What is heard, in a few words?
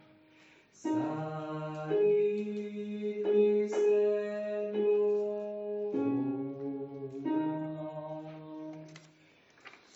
alto